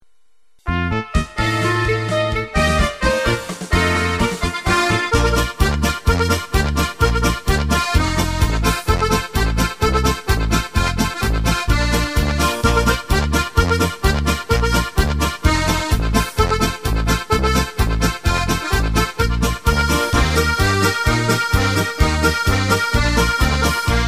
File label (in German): trompetenecho